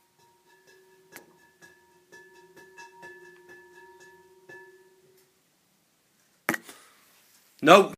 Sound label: Electrified Environment